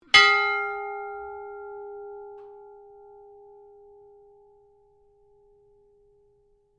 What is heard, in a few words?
SHIPS BELL RING; Ships Bell Alster Star; Various ships bells; Boats; Vehicles